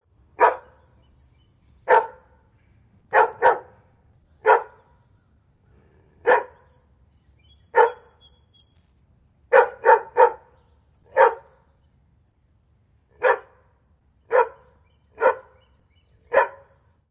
Dog Brk Ext, Multiple barks of a Golden Retriever who just won't quit; Dog Barks Multiple Times 1; Dogs; Animals